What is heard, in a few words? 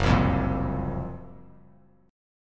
Gb Major 7th Flat 5th Gb down Bass Standard; GbM7b5 strummed; JGuitar